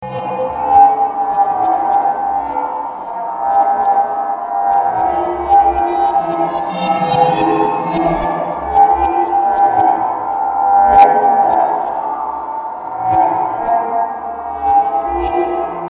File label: piano lesson; Kontakt